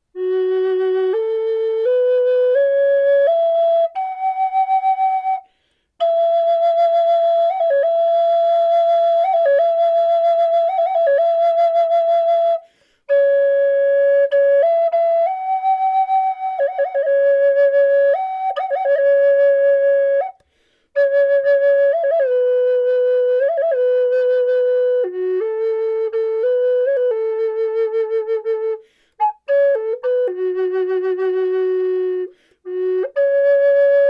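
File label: reverb, flute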